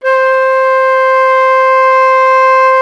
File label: FLT, WOODWINDS; Roland - LA Composer vol. 1